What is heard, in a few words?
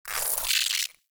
Foliage Insect; Ambience; Props; Warframe; Duviri